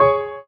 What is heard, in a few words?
Drop Lead; osu